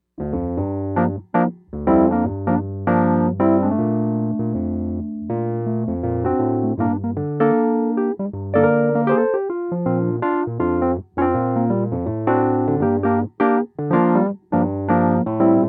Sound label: groove DI, electric piano